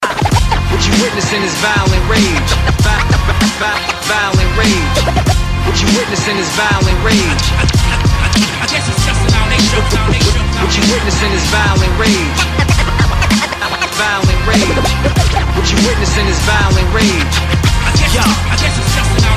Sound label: Violent Rage